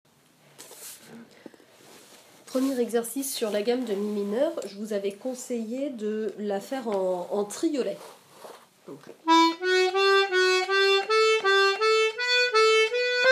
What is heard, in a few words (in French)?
Gamme En Triolet